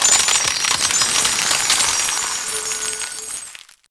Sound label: freeze spell